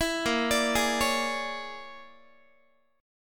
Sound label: A sharp 7th Sharp 9th Flat 5th, Guitar; arpeggiated; chord; JGuitar